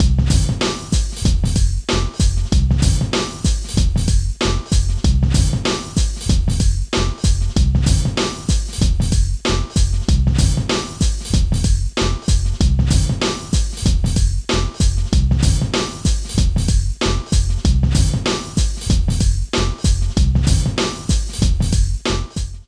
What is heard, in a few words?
Menu Loop; NBA Street; GC; PS2